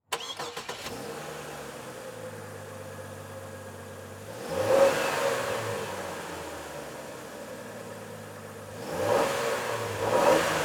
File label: Truck GM Envoy Start Idle Rev Off Exterior; transportation